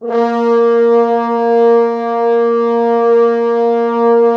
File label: Orchestral Brass